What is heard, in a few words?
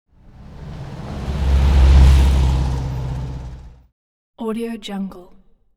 camper van moves from left to right; hollywoodedge